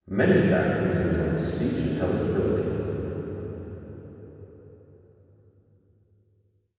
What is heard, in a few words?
Gymnasium with 5 second reverb time; T.C. Williams